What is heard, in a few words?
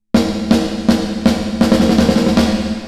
Plate steel; UVI